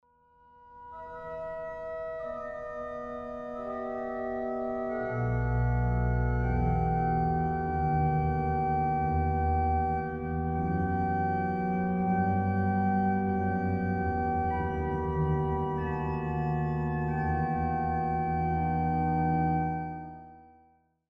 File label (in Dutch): Koraalbewerking; God Is Het Verbroken Hart